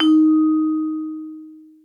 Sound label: VIBE D#4, VIBRAPHONE; Greytsounds VOL-1